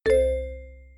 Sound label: Ding